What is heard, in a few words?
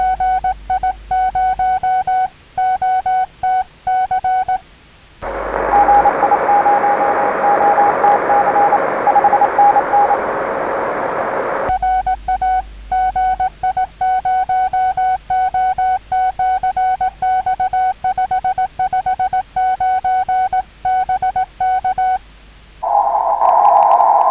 cw; cycle23; 6M; GI0OTC 50Mhz, VHF/UHF, HF Utility and Military monitoring